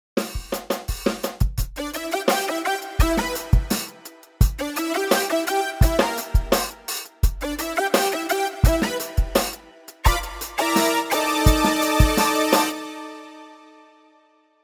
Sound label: Poly Synth Analog Brass JX; Ultra Analog Session